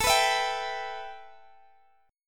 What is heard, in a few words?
A 7th Suspended 2nd A, Guitar DADGAD; A7sus2 strummed; x,x,19,16,19,17